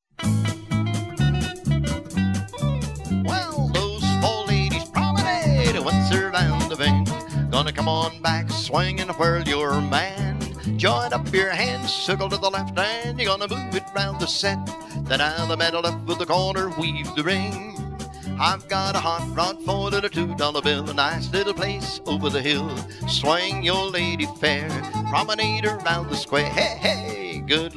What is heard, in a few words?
vocal, square dance music